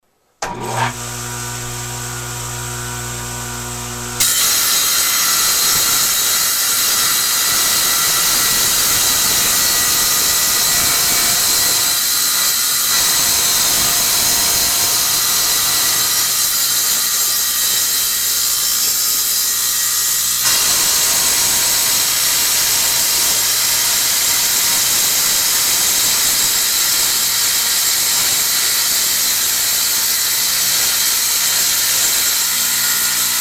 Wet Saw Mix, industrial noize pollution, floored, power tools